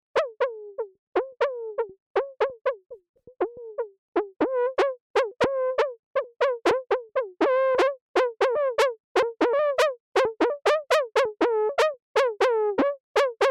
Serge Filter Self FM; Kobol

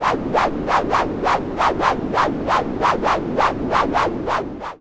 SH Noise Autobeat; GRP Module

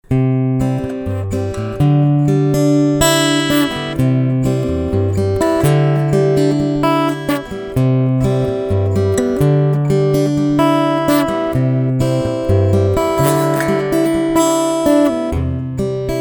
Fingerpicking

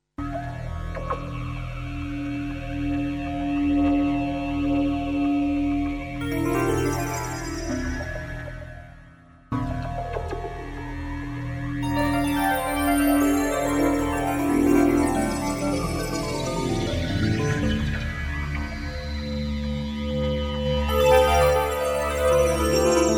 more Noise

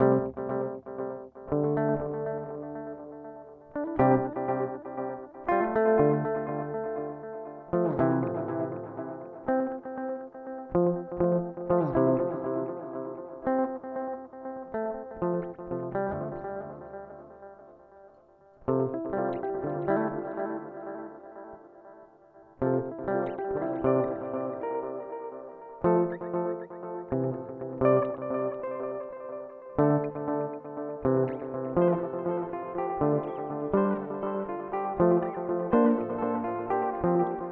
Timeline Stereo Pattern; Strymon

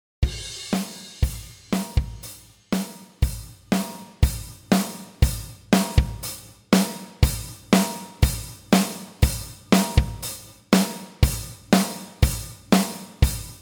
Drums Discrete Drive Character; Softube Console 1 Fader

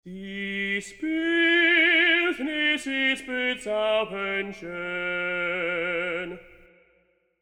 Voices Of Opera Tenor Phrase Intonation Original

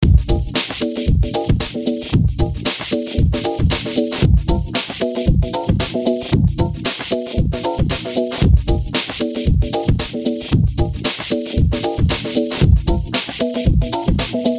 Drumloop chords; IL Harmor

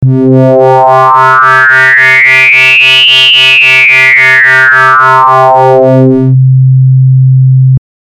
Mod Int lin FM

